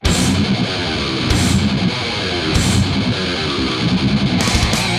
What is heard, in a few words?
TS-9 Mesa Triple Recto